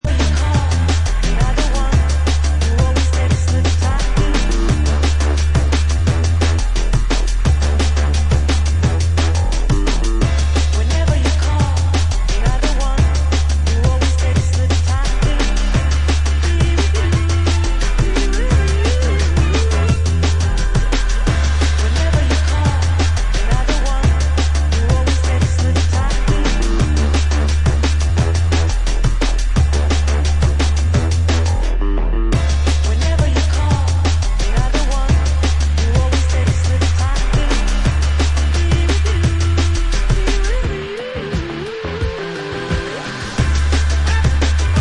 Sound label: Drum Bass